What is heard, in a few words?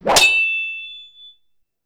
Sword Swipe And Klang; Weapons & Explosions; Sony Pictures Sound Effects Series Volumes 1-10; Sony Creative Software